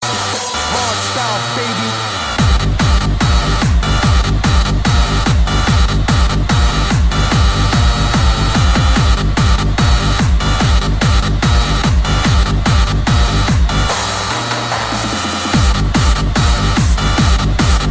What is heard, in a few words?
Hardstyle